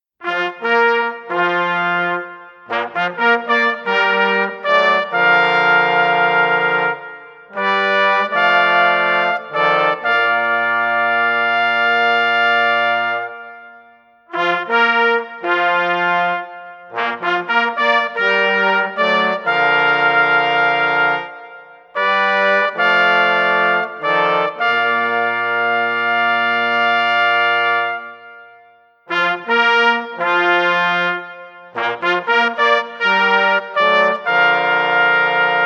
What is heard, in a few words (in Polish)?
hejnal; konin